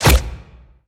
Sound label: void consumed